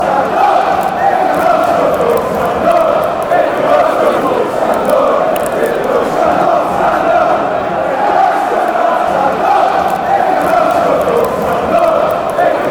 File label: chants; stand up if you love scunny